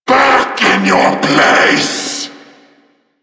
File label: Vocal Attack; Warframe; Duviri; Dax; Vocal Set A